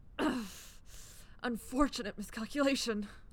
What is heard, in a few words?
damage